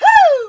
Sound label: punch hoo; sm64coopdx; sfx custom toad peach